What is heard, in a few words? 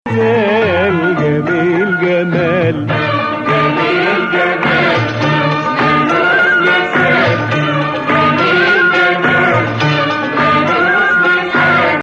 Nakriz; Nawa Athar Gameel; Maqam Nawa Athar; Nakriz 1